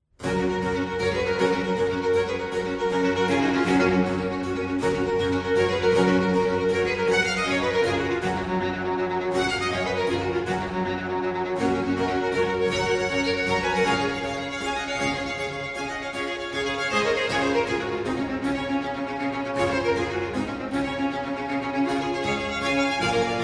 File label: Presto, Concerto in G major for Strings; Antonio Vivaldi; RV 151